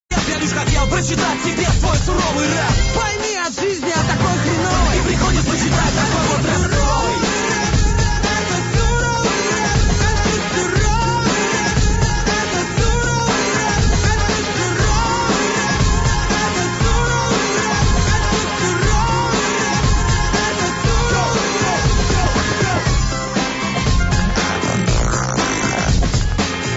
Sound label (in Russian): Suroviy Rap